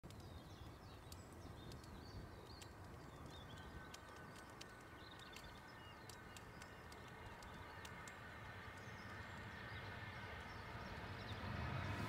Airbus; تیکاف

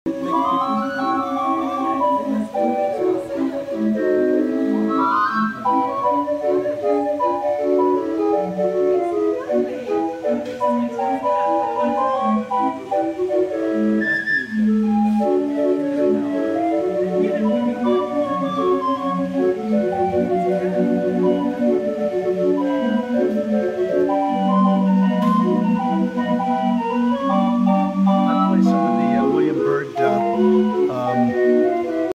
Calliope

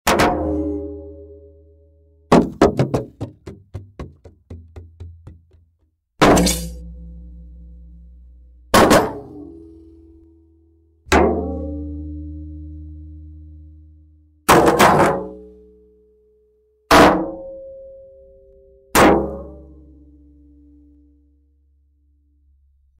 shield

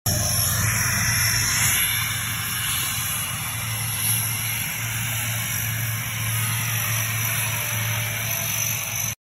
Lockheed C-130 Hercules

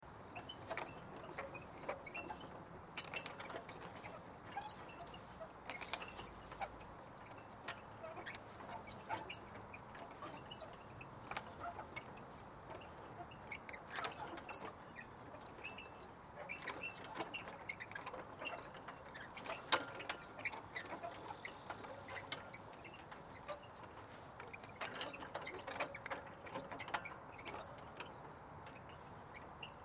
sound park swings swinging

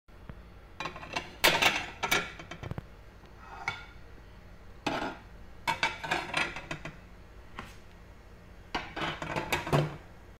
plate

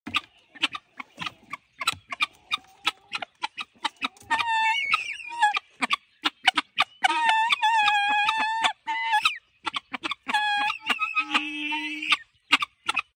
Guineafowls

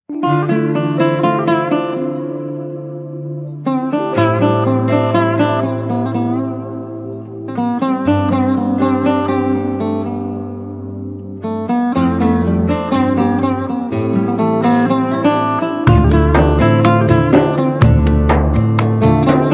Cümbüş

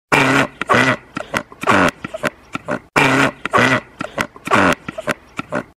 Cormorants

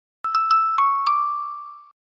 electronic payment; پرداخت کردن الکترونیکی

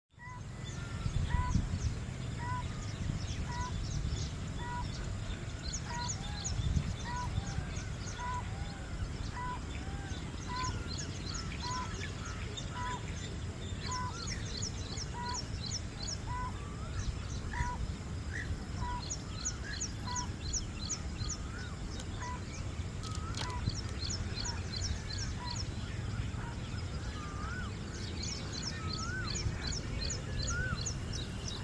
Greater Painted Snipe; پاشلک بنگالی

